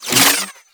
Parazon Hack Interaction In

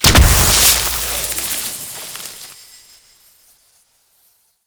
Plant Explosion; Warframe; Duviri; Gardening

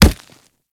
Kubrow Run Dirt, Footsteps; Warframe

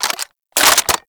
Reload; Warframe; Weapons; Supra

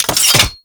Rolling Drone Turret Levitate End; Warframe; Grineer

